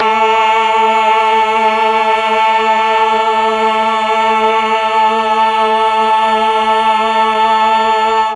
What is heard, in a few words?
A2 8VOICE CHOIR, mellotron